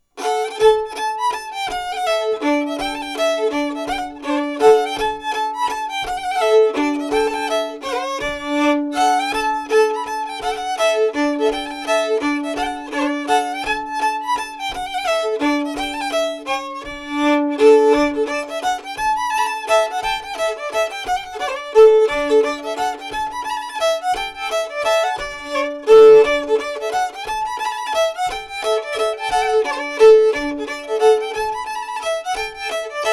Mazurka; Mazurka de Siccard

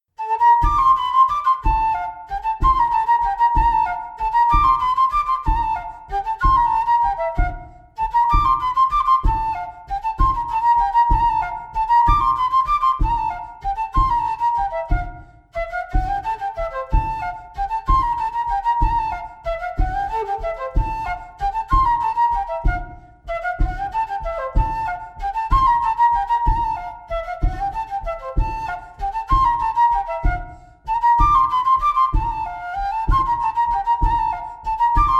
bourree; Coatrieux

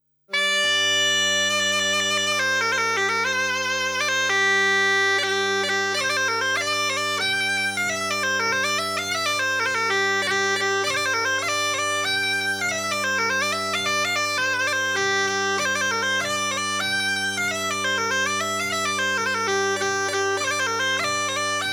Scottisch; Scottisch de Pechadre

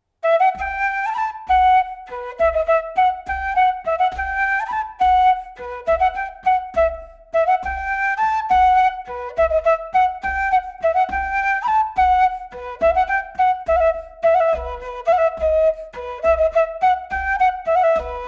Montagnarde

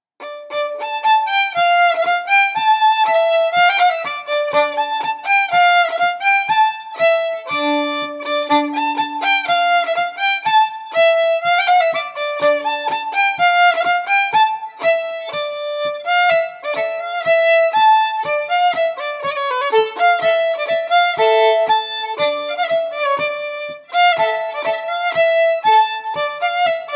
Bourree

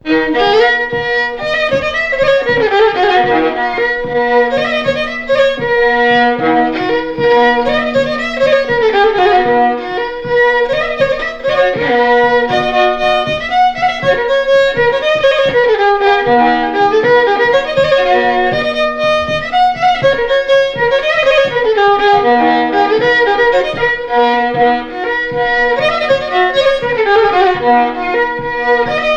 Bourrée; Bourrée de la Vedrenne

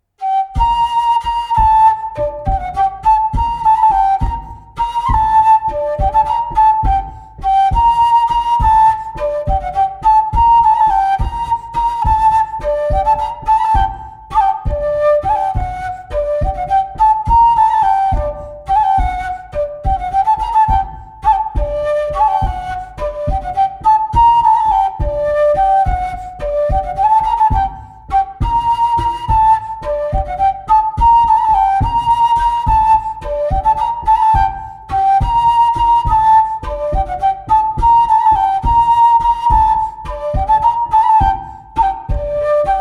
Montagnarde; Recueil Laussedat

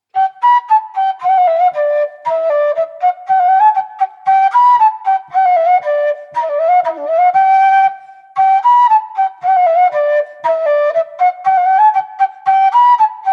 Bourree

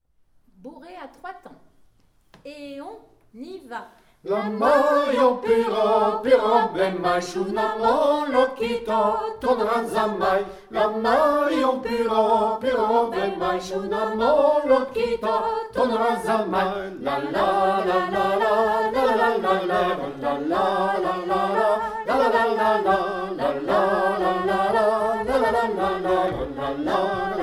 Chant

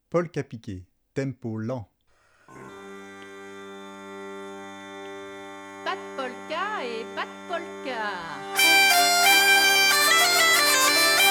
Polka piquée Tpo lent Inst; Polka piqué Pa Piqué Tempo lent

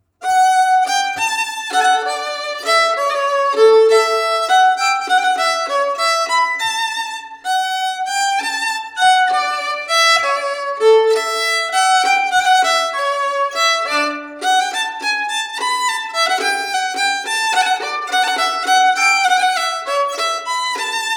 Valse

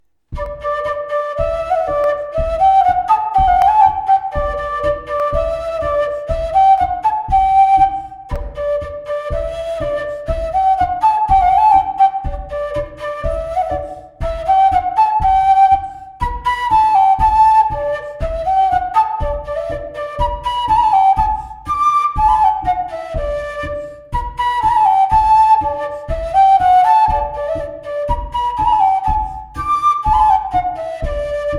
Danse piemontaise Bouree; Ms Dauternaux

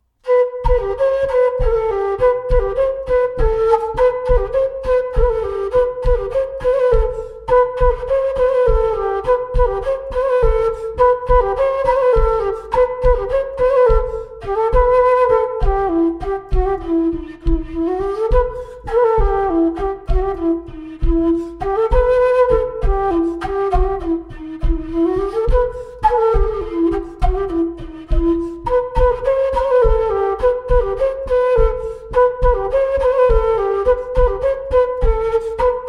Montagnarde; Recueil De Raoulx

